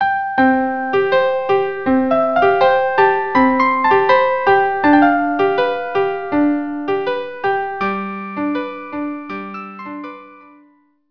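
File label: la paloma, violin duo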